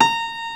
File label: STENWAY; East West; Global Sounds